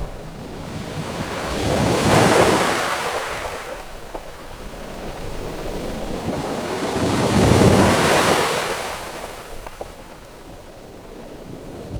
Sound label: ambience ocean